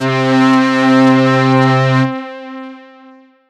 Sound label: Ensoniq SQ-80 Strings C5